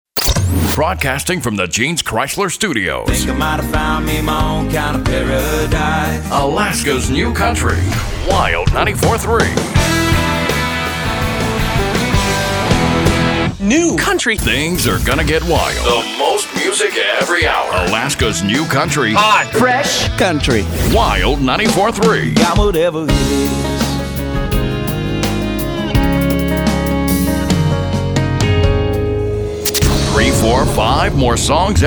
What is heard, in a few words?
Country